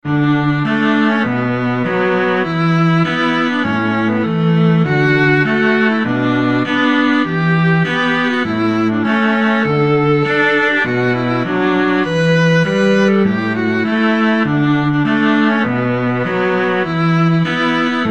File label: i love the mountains, viola cello duet